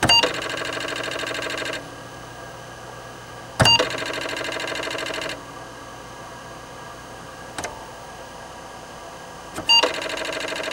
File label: Apple II booting